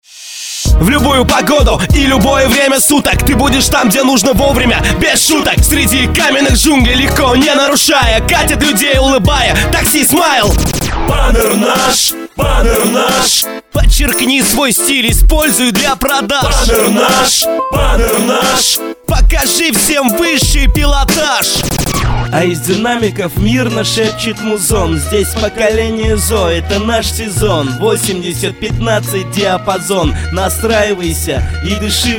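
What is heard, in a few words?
vocal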